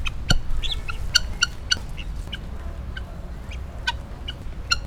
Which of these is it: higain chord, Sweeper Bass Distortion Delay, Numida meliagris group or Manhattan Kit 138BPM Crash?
Numida meliagris group